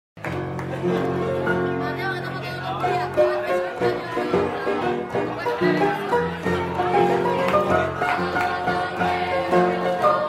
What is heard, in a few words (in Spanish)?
PIANISTA EN BAR